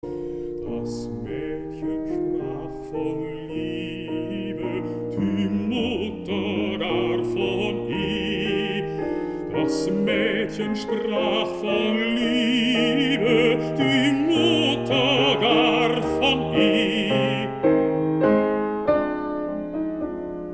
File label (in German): Vokalmusik